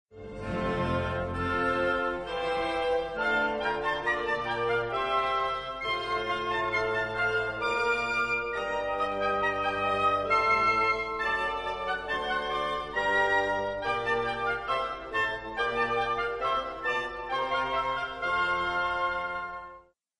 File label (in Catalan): secció vent fusta